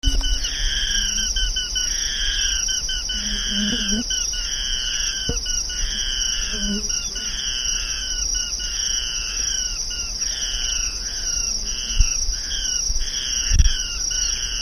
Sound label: Micropygia schomburgkii